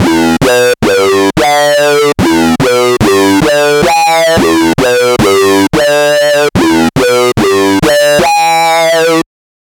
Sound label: Familiar Sync; TEO-5